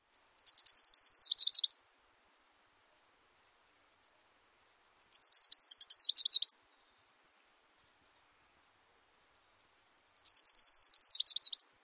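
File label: songs, Orange-crowned Warbler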